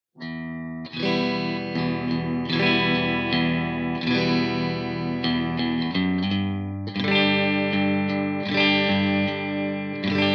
new tele middle

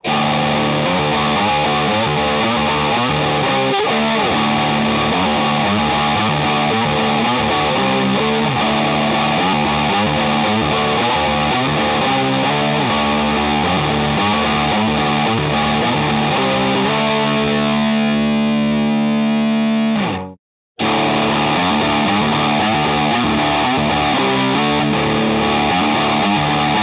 modern dirt

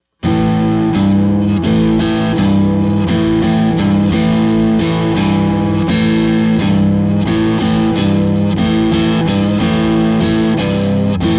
dirt, custom pickups

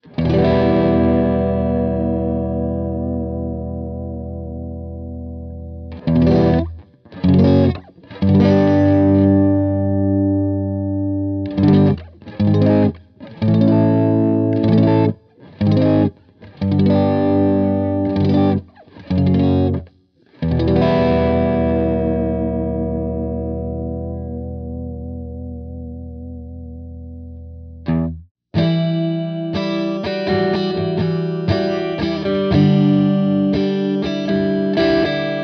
neck dirt, pickups

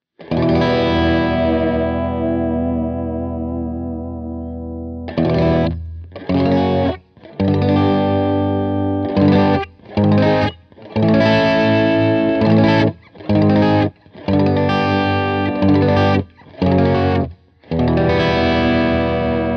bridge dirt, pickups

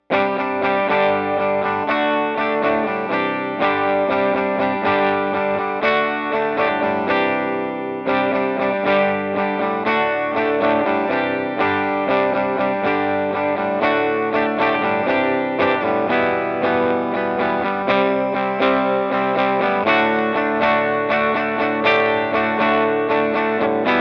Clean 1973 SG Special Stock Pickups

